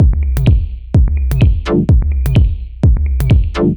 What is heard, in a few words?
127bpm, House Drumloops; 90 s Sample CDs; Zero-G House Fabrik Drumloops; ZG Drumloops